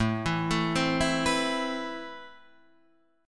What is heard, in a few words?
A-Minor Add 9th A; arpeggiated; JGuitar